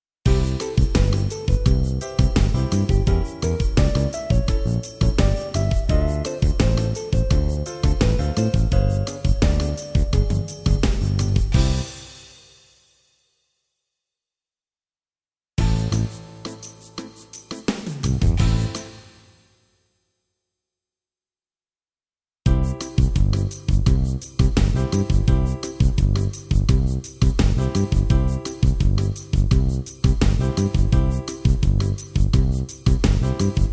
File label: Popbossa